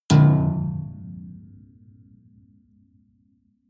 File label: metal impact piano string damped hard